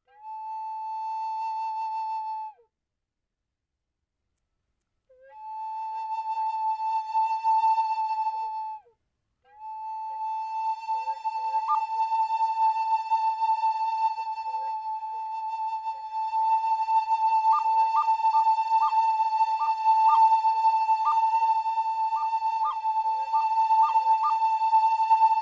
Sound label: Dream Reality recorder